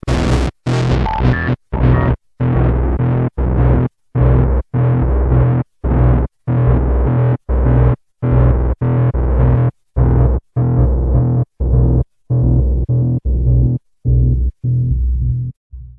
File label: analog, special fx twisted; USB Soundscan; AKAI